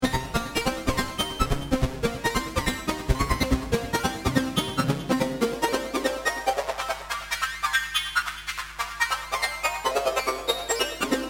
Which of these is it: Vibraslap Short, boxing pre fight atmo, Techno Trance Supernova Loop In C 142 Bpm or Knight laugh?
Techno Trance Supernova Loop In C 142 Bpm